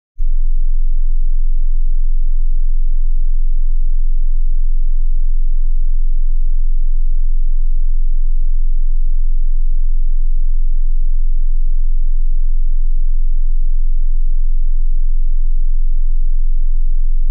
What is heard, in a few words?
21.5hz Sine Wave 0db R; Denon Audio Technical CD